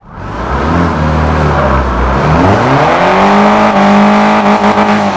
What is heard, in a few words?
first, vehicles; 242turbo